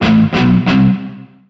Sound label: cruchy guitars